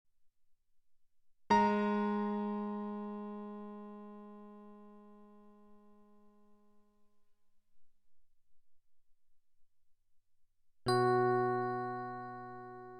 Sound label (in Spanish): intervalos abiertos